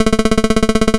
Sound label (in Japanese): 会話; nes retro talk sound effects